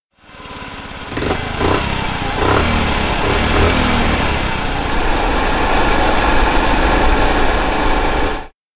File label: bike accel; anemoi